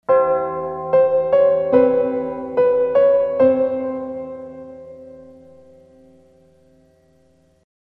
Cemetery Bell

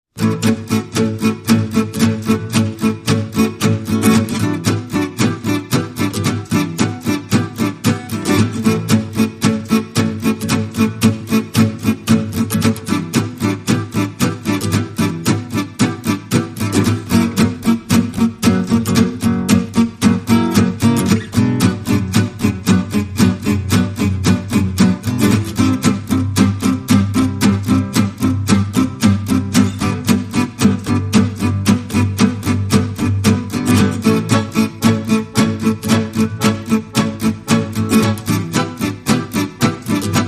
Guitars and Accordion

